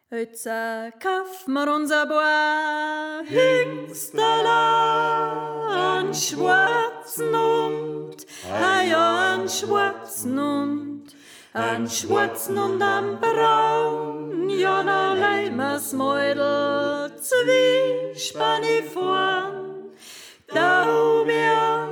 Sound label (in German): Sopran; Iatza Kaaf Ma Uns A Paar Hengstala